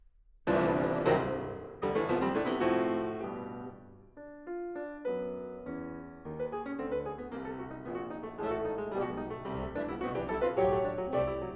Concerto For Piano And Wind Instruments - Mvt. 3. Allegro